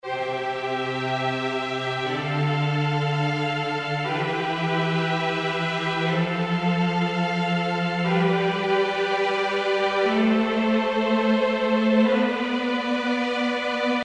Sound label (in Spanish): progresion do mayor